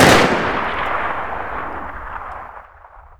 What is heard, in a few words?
mg42 shoot